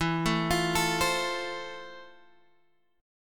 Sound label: F Major 7th E